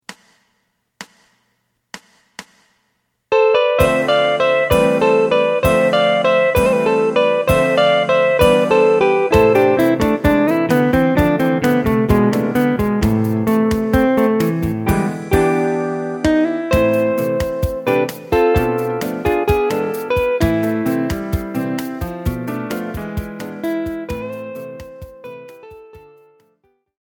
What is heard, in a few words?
bass